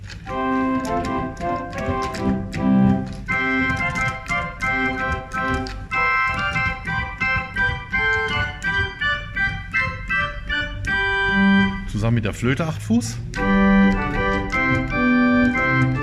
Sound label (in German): Englisches Positiv, pipe organ